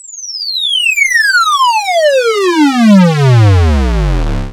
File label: sweep, SCIFI; Techno Trance Essentials